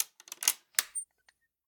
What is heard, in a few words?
Lockbox Unlock; The Town